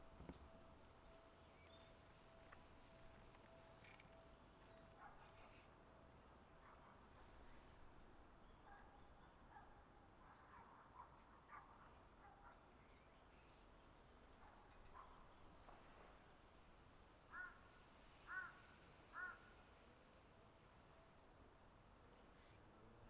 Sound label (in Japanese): FRONT NORM, 上り電車通過。; H2essential MS内蔵マイク指向性120°＋; ZOOM ヘアリーウィンドスクリーン WSH-2e; 録音したファイルを、本体でノーマライズしました。; ZOOM F6 MultiTrack Field Recorder